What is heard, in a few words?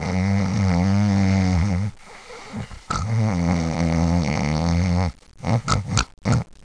SNORING